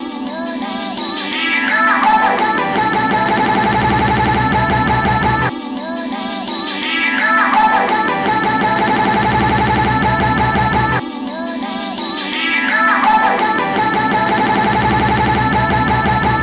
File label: RINGTONE